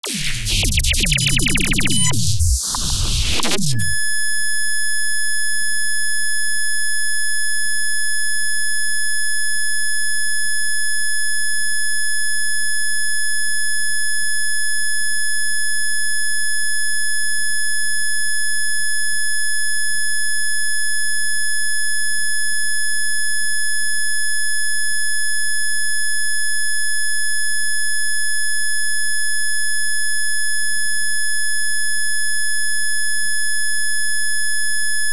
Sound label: Artifact, Electronic